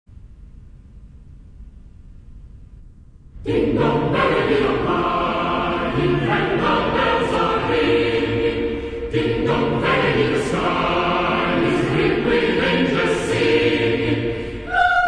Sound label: Cathedral Choir